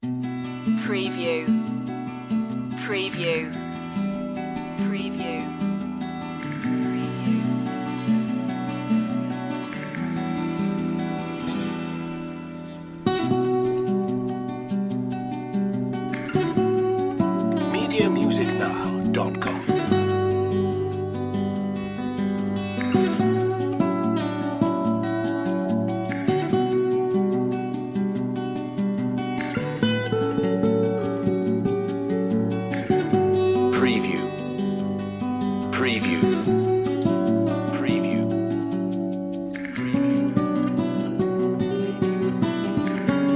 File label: flamenco etchings